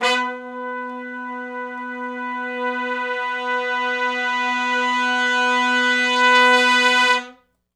2 measure Long Note w Swell (B) unison, Horncraft for R&B; Crimson Blue and Fabulous Horncraft 4 RnB; Sections